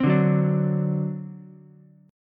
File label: C Suspended 2nd Flat 5th Ukulele, Csus2b5 chord; Csus2b5 strummed